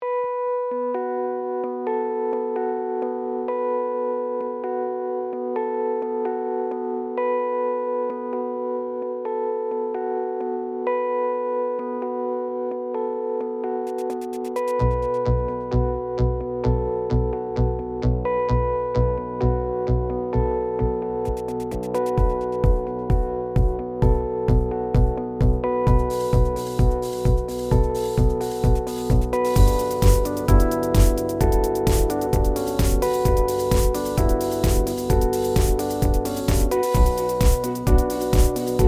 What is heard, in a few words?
dance house hip techno; Jamie Coleman, Film Composer